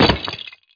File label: fall apart sound; lego star wars